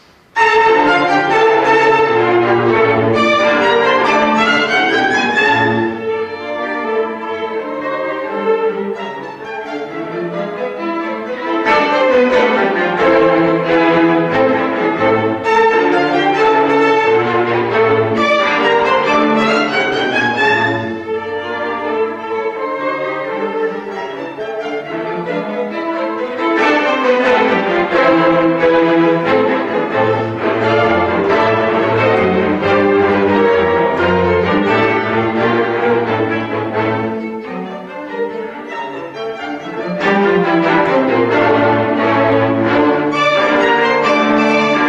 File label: Quartet theme; Death and the Maiden